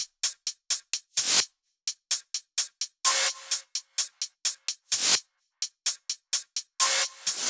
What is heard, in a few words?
128BPM Flatbeat Kit